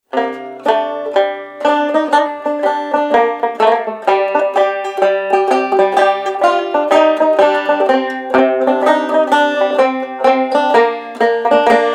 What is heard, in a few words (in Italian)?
Mazurka; Ivano